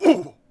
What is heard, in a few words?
Hit Vox; Civ4 warlords